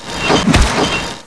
strike

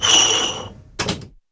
puzzle bar slide; ambience

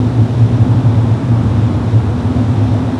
ambient

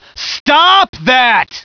hurt soldier

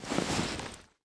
usas12 draw, weapons

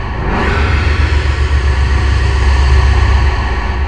horror

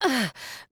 ugghhh

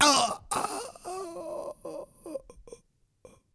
police die

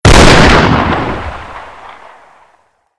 shot fire high

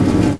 move, Metal; Physics

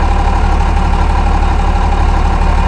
snowcat loop; ambience

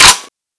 g36 bolt forward, weapons

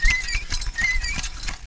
duck rolling

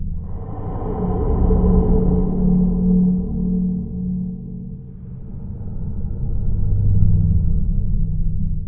drone breath loop

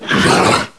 pain

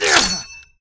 gren throw

